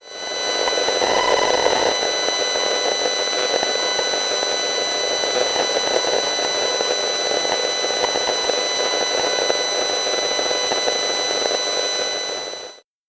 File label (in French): Antenne relais telephonie mobile echantillon; Antenne relais téléphonie mobile - échantillon 1; Ondes électromagnétiques et électromagnétisme